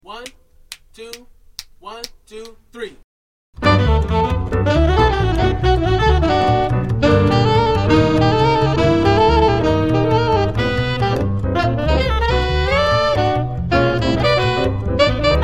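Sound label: minus Drums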